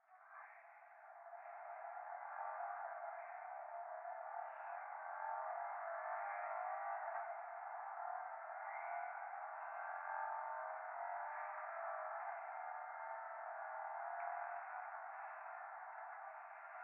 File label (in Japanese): 風呂 右耳密着吐息 車の中